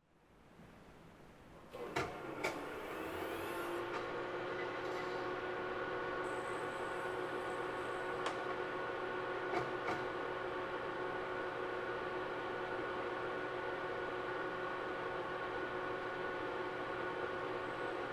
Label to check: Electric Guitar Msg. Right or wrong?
Wrong